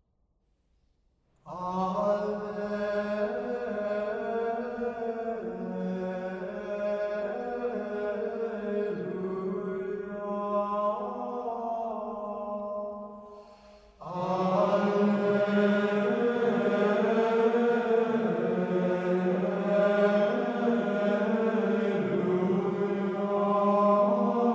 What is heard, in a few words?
Alleluia Ave Maria; Rorate Coeli Marian Sounds of Advent